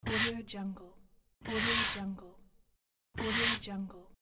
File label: broom sweep